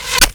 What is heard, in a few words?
quill target; weapons; dota; bristlebog